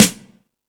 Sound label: Beat Box; E-MU Pro-Cussion